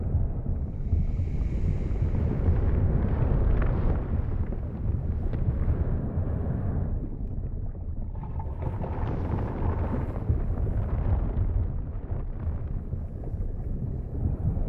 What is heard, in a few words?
Snake; KardaMahal Underground Temple